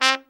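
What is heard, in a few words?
BRA TRUMP, trumpet; Best Service Gigapack 1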